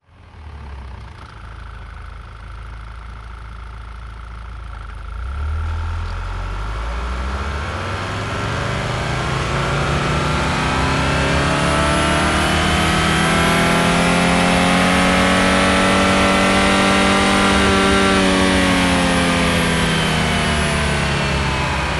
audi a4 allroad 2.0 tfsi engine different rpm ramps; mono; sonniss gdc